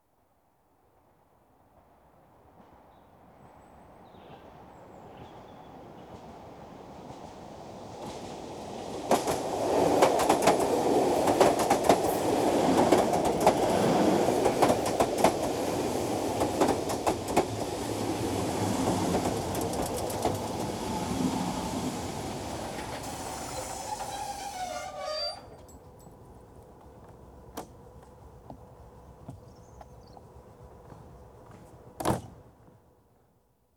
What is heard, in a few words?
Electric Trains Train arrives; The BBC Sound Effects Library